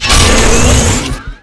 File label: Large Shield Pickup